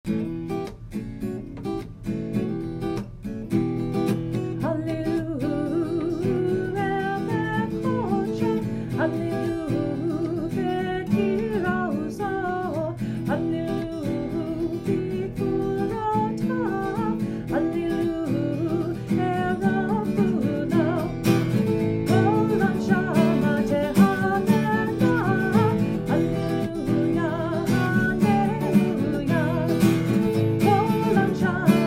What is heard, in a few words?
Psalm 150 Yemenite Melody; Pg 100 Psalm 150 – Yemenite (melody)